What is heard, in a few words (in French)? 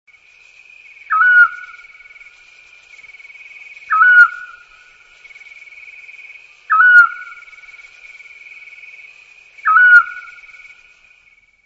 petit-duc scops, oiseaux chant